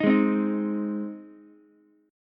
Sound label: Ab Major Ab down Ukulele; strummed; JGuitar